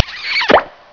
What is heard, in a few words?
Smack